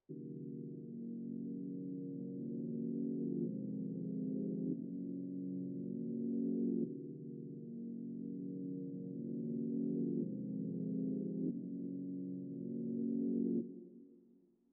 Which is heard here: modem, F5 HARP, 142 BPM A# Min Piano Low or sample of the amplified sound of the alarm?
142 BPM A# Min Piano Low